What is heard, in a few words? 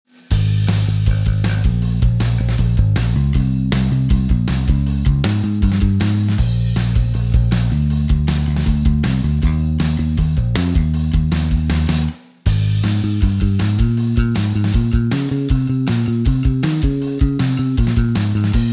rhythm bass; modobass; jb